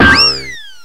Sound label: Coyote Fling; acme rocket dash